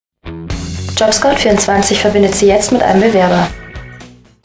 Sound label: jingle